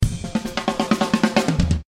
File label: Drums